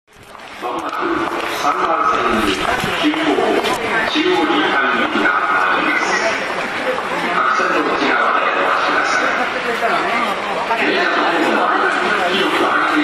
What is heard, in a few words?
arrive, metro; Rail Sounds Collection; Hanzomon